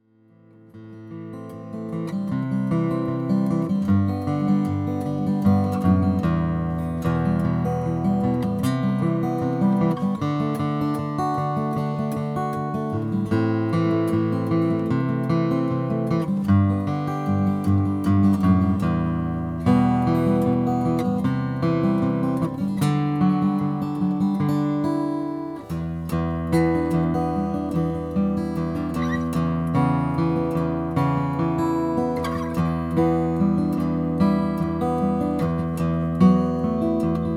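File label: rainsong graphite guitar om1000n2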